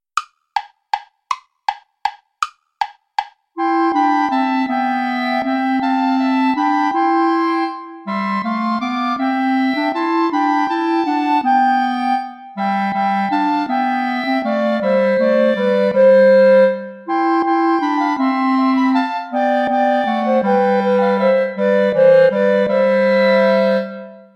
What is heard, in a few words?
good christians all rejoice and sing play along without bass clarinet; vulpius melchior